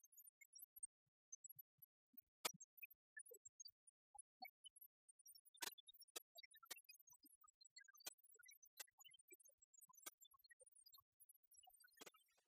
Flushing Toilet

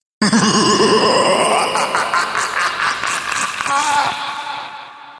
Evil Laughter

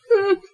Whimper

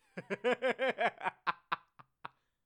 Laughter

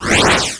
Flame

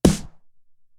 Full N Punchy Snare

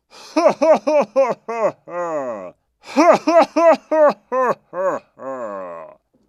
Man Laugh Evil

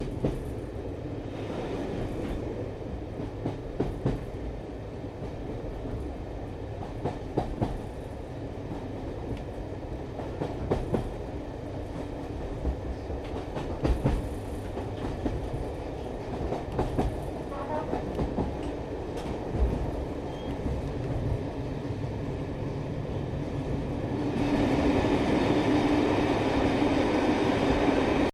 Train trundle

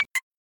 Click With Two Parts

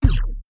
Punch

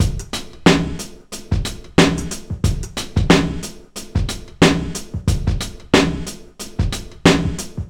Hip Hop Mpc500

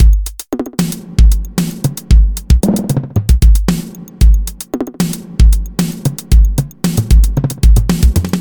Loop

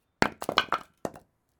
Skittles

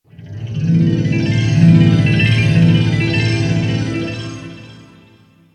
Wonder Harp

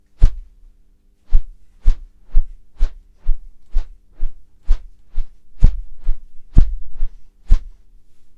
Metal Boot Footsteps

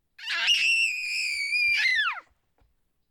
Scream

